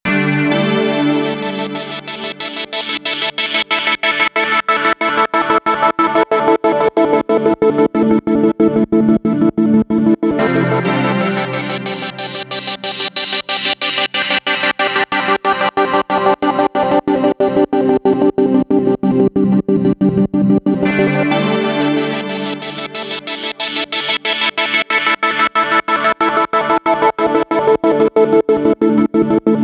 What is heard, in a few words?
drone